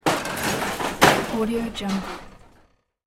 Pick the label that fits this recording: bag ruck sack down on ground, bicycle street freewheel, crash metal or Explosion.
crash metal